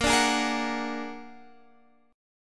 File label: Bb Augmented 7th Bb down Guitar Standard; Bb+7; strummed; JGuitar